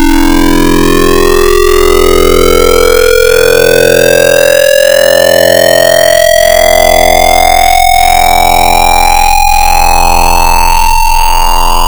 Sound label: Scifi, FM Wavetables; Cymatics; FM Wavetables Vol 3; Serum